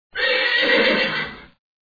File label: horse